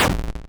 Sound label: explode